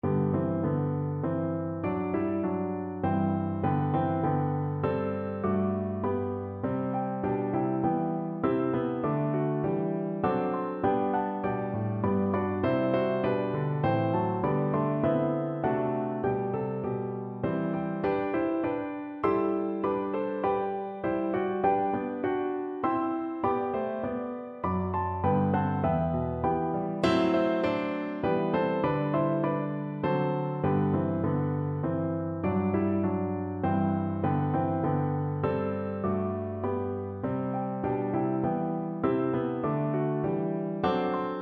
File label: holly ivy, piano duet